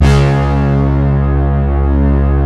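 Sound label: synth, Prophet Five, Analog; E-MU Formula 4000 Series Vol. 3 – Analog Odyssey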